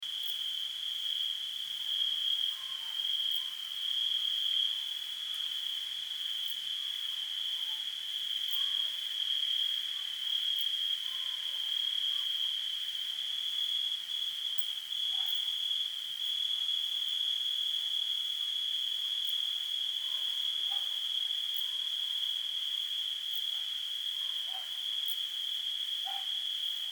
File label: forest soundscape night time; Forest Night Ambience